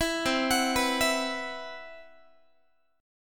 C Major Sharp 11th, C, down, Guitar; CM#11; arpeggiated; 19,20,21,21,20,x; 4A,4E,5A,5D,5G#,6C#

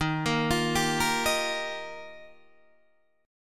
D sharp Major Add 9th, Guitar Open D

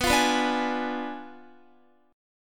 B Diminished 7th B down Guitar; strummed; tuning 4F,4A,5C,5G,5C,6E

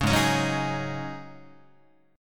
G sharp 7th Suspended 4th G sharp, Guitar; G#7sus4 strummed; JGuitar

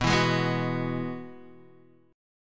E Major Add 9th B, chord

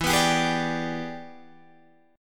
E 7th Sharp 9th Guitar Open D, chord

